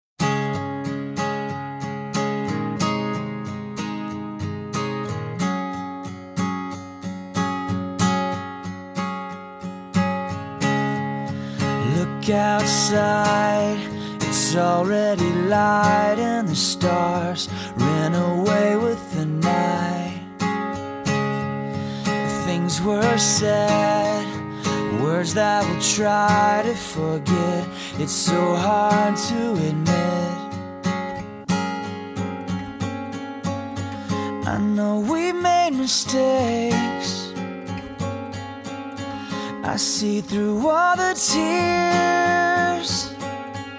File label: ocean wide acoustic